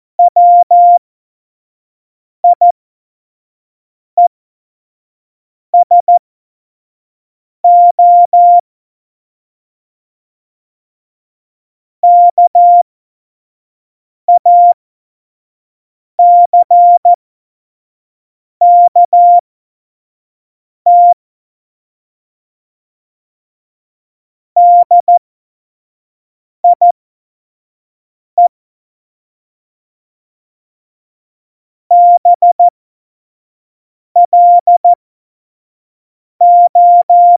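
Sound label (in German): Witz, Tempo 70, Morsekurs, cw morsen; Witze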